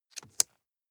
nylon leather click

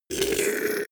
beast choke deadly short

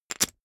poker chip stack big rattle short

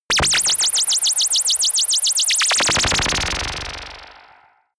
general ufo twistings

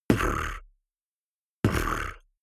beatbox bass drum tongue roll damped

